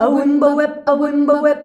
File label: AWIMBAWE D, FEMALES, Hyper Dance